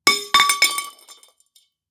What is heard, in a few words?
neon drop